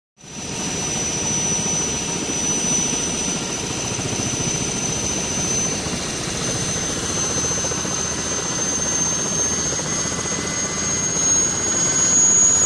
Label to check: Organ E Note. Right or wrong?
Wrong